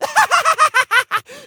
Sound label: Mad Laughing; don t be afraid the first toy